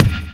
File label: Feel Break